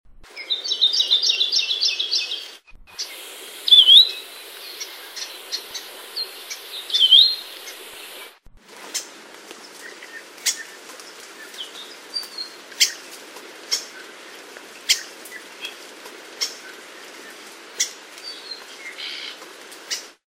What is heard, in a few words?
Kiwikiu